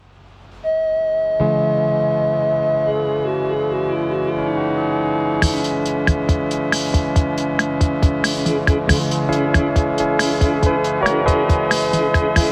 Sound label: Casio; Casio CK500; Synthstuff Casio CK500